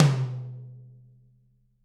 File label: TT, Toms; Bob Clearmountain Drums I